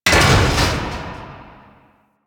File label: Puzzle Switch Activate; Missions; Raid